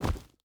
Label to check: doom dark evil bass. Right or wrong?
Wrong